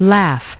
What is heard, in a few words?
laugh